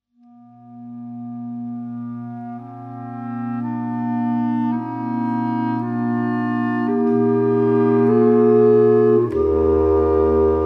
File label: oboe